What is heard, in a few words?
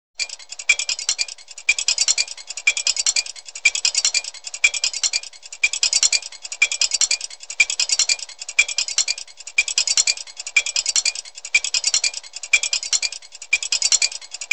Sci Fi Robot Motor, Sci Fi Robot Tack, Even; Rosey's Robot Motor